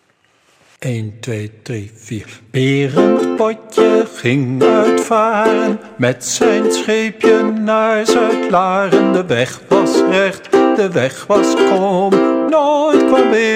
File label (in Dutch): Berend Botje reggae